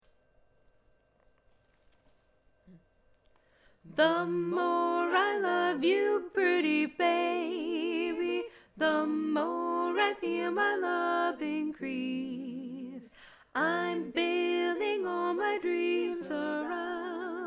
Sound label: alto